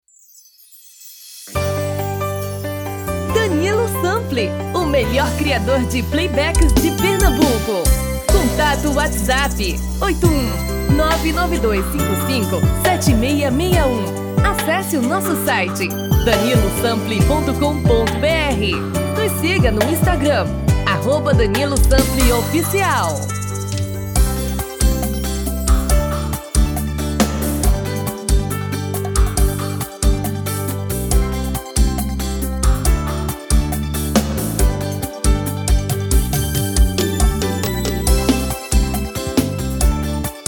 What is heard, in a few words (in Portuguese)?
Vers Brega